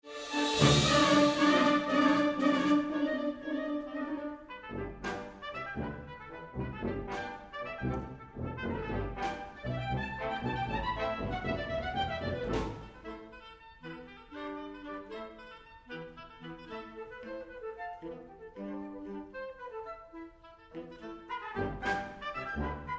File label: Hoedown